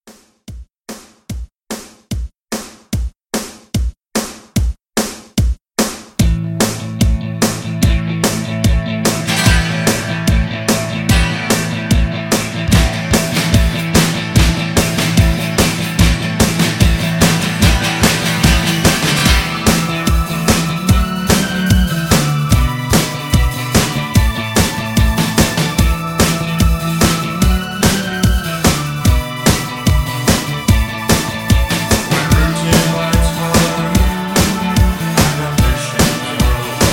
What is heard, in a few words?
80s Rock Re Drum 147